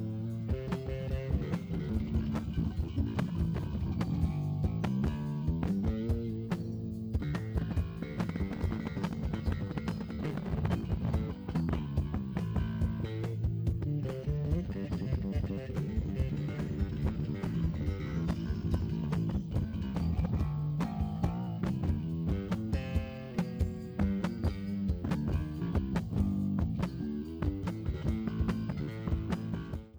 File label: bass solo, live